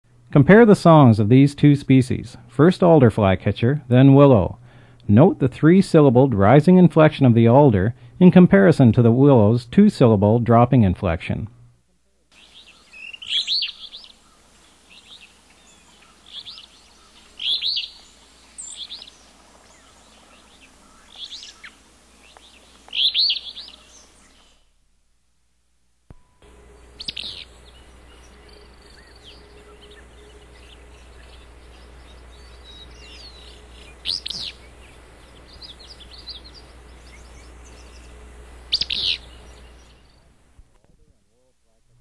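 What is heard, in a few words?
Alder Flycatcher vs Willow Flycatcher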